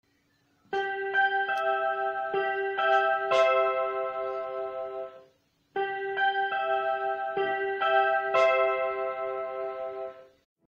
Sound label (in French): sonnerie de lycee